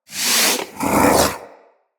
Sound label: Vocal Breathe, Hunting Critters; Characters; Predasite